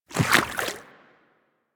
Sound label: Small Walk Water, Infested Footsteps; Warframe